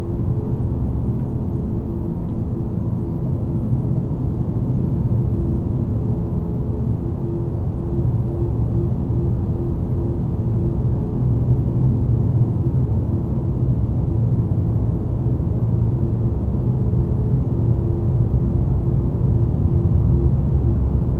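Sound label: Ambience Engineroom; Xbox bios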